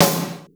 POWER S; R70 KIT; Crypton - DrumBase5000; R70 KIT #2

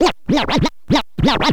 scratch short, Vinyl scratches

